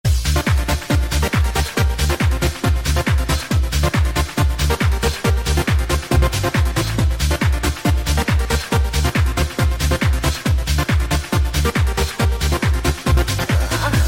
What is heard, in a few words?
Hype Beat